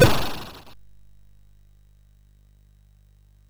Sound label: Power of Black Bandit, MOS6581R2